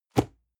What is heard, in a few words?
cardboard box medium place soft; objekte